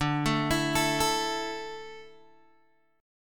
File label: D Suspended 2nd D, down, Guitar Open G